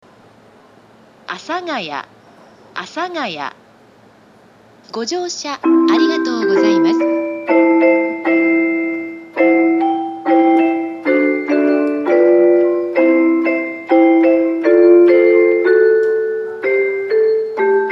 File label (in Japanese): 発車メロディー（たなばたさま）, ※音声には到着放送が被っています。; 阿佐ヶ谷ＶｅｒＡ; 曲は「たなばたさま」です。; ダイヤが厳しい上に曲が長いため、なかなか最後まで流れません。; １番線